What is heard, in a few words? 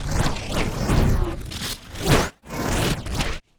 wooshes transform sweetener